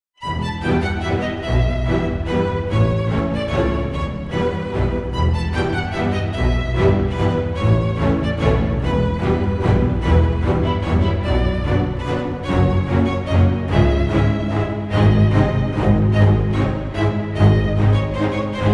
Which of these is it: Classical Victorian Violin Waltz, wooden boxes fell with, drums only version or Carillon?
Classical Victorian Violin Waltz